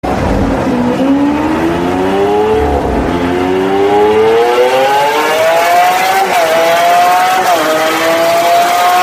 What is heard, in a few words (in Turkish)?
lamborghini aventador volante egzoz sesi